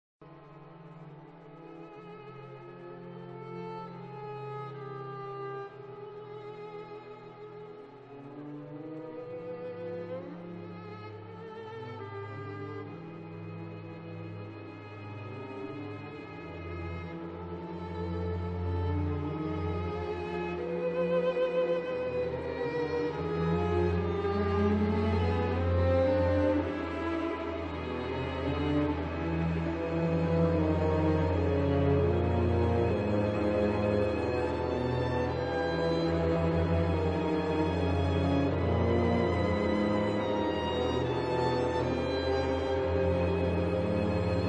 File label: violin concerto; Rautavaara Einojuhani